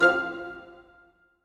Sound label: Goblin Rise; King's Quest Chapter 1: A Knight to Remember